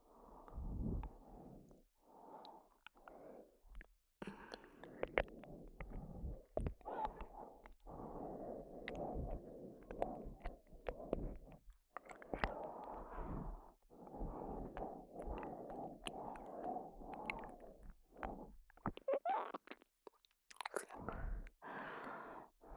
レロレロレロレロ…; 右耳

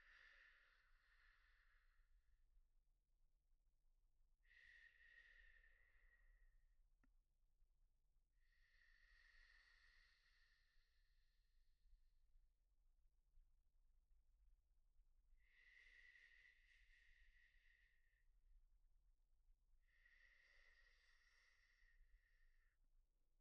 寝息・彼氏と一緒に穏やかに眠っている彼女; お色気お姉さん系カノジョ

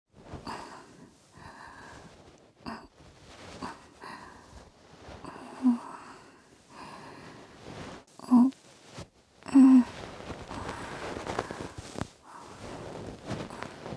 梵天 左; えろごろ！

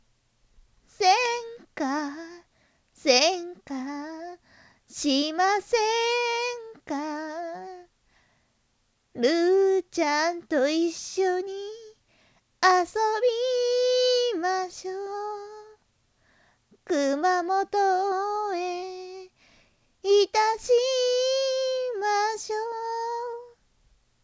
しませんか♪バラード風 歌い方; けもみみAちゃん; リリカちゃんっぽい歌い方・真似っこ

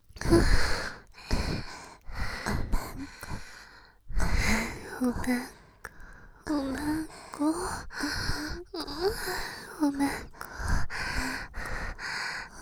喘ぎ; お屋敷メイドのハーレム淫語ヘヴン 気が済むまで耳舐め淫語を堪能できるバイノーラルオナサポ音声

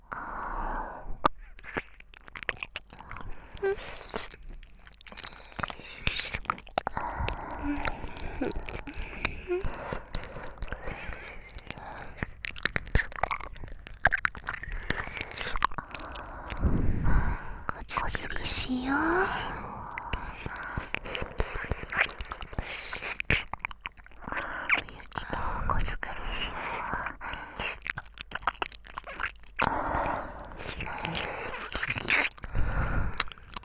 耳舐め

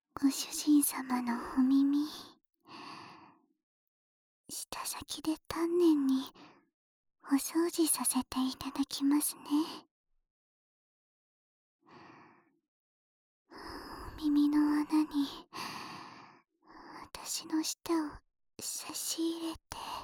ねっとり 耳舐め（右耳）; 栞のねっとり耳舐め（右耳）

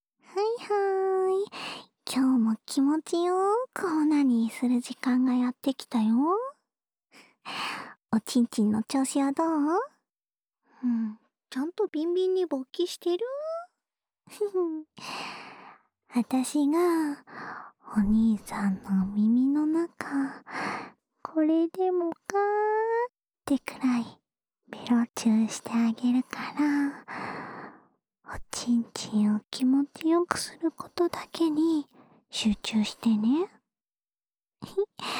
オナサポ用耳舐めループ（左耳）, ASMR

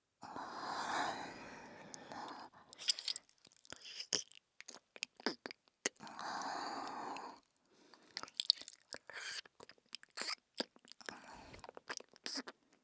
左耳舐め

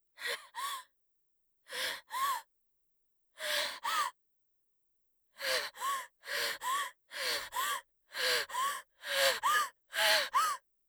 吐いて吸う息使い; 効果音なし

